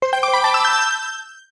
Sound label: Press Start; Press Start at the title screen